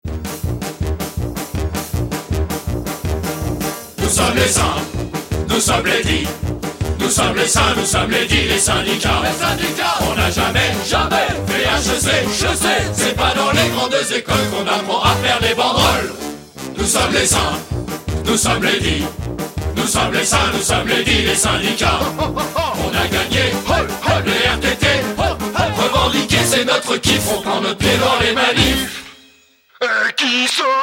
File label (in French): FANFARE; SYNDICALISTE